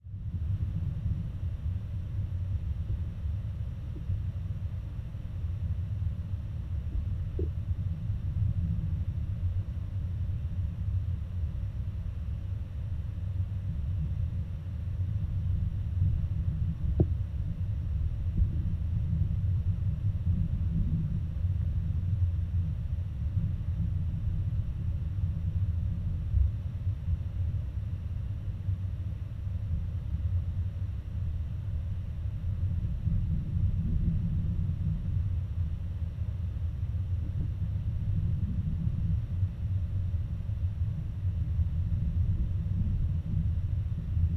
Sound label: Geofon, Waterfall, Vibrations; underground sounds